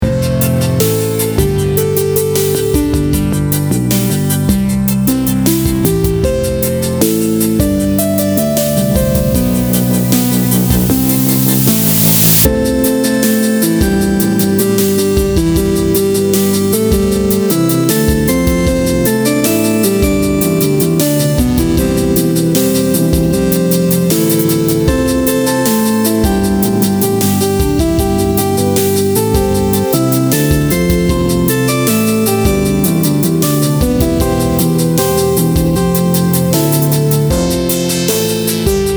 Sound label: gumdrop plains, A harmonic upbeat synth production